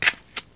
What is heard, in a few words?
step on the wig; animal